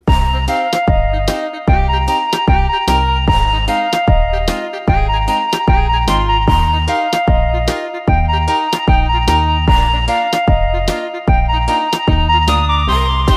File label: flute